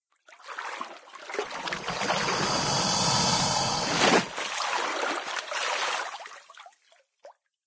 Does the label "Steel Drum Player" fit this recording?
No